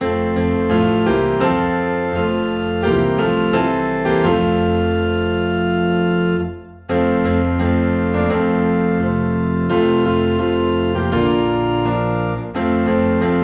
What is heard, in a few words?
Piano and Organ Low in F; Bringing in the Sheaves